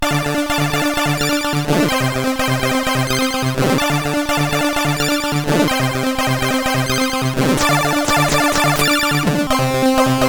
Mr Rap 2, CSG8580R5

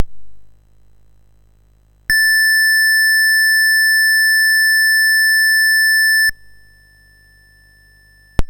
Dragonworld sid MOS6581R4

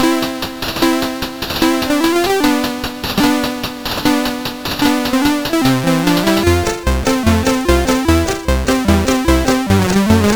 Paddle Mania, CSG8580R5

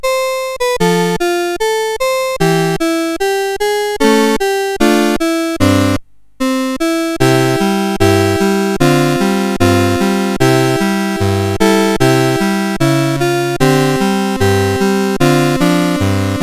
Marines Hymn, CSG8580R5

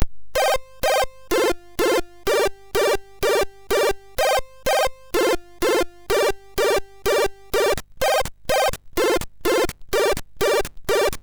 Disco Disco, MOS6581R2

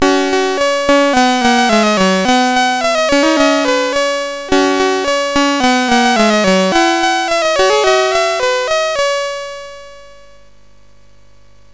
Revenge of Mutant Camels sid MOS6581R4

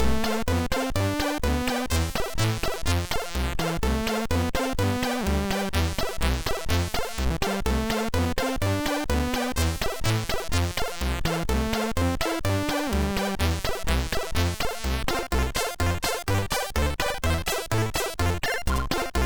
Guldkornekspressen Lvls, MOS6581R2

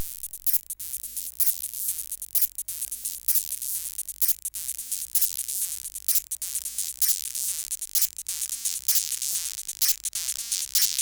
Loop Song sid CSG8580R5

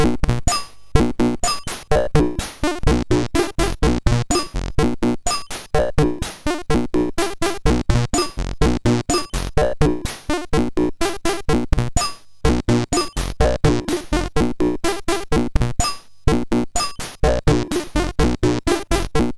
Minimalistic Loop, sid MOS6581R4

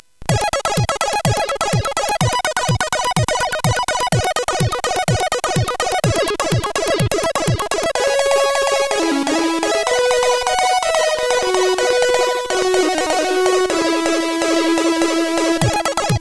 Double Voice; hvsc

